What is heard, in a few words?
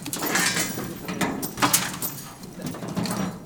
debris metal; metal tools rattling in toolbox